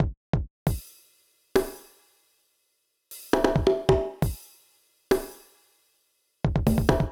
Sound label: Beat - Perc & Kicks, 135bpm; Zero-G Sound Sense Grime Scene; GS Construction Kits; The Hoodrat; 90 s Sample CDs